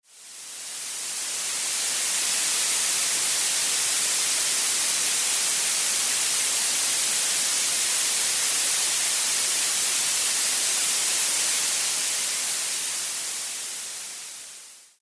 Blue Noise; ambient masking sound

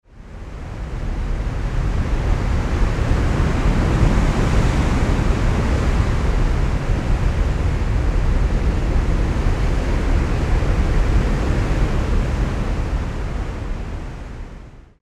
Ocean Sounds and Brown Noise

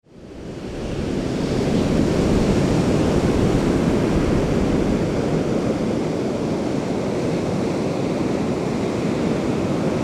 Ocean Wave Sounds; Sleep; Sleep Fast

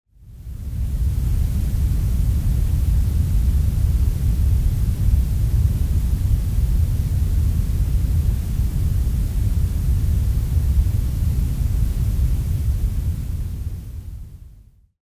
heavy bass grey noise

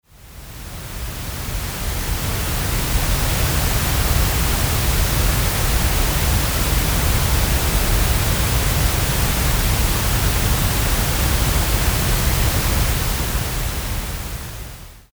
neapolitan noise

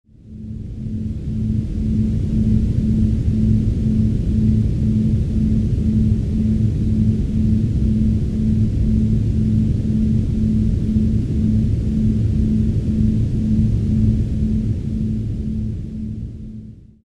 Binaural Beats Brown Noise 2Hz Delta Wave

